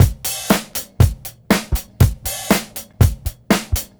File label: HEY BEAT, Roots Disco; USB Soundscan vol.32; AKAI